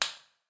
spank; spellbound

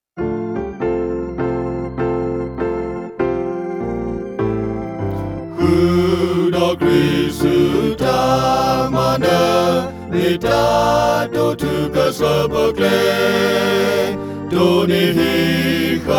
Victory song

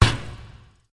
Ring, basketball; basketball-stars

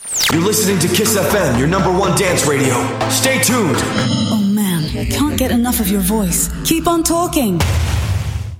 station jingle

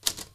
typewriter

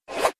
slide

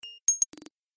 ring

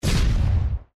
boom

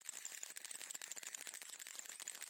spin high risk 2 line; hotline

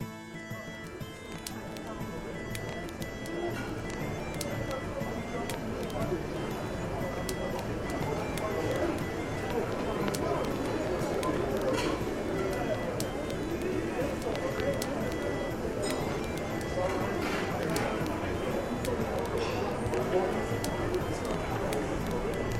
Lobby Ambient; heroesofholdem

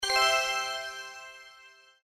success

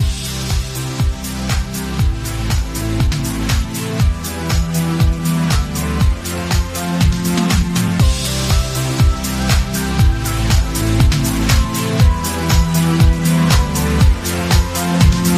base loop

